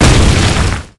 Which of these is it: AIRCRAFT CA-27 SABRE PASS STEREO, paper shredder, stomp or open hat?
stomp